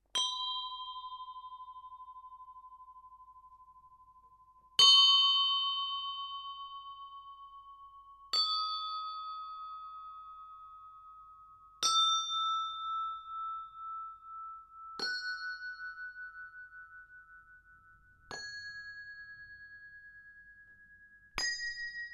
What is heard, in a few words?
Bells Ships Bells Sequence, Brass ships bells set; Musical